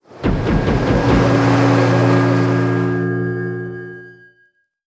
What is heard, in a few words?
teleport; cstrike; war3source; archmage